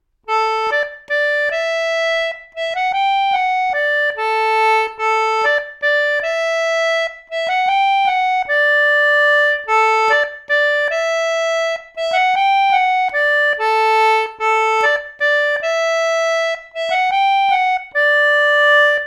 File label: mazurka, concertina; midsummer